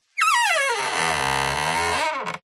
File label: Wood Stress Creak Squeaks; DPA4060; pmsfx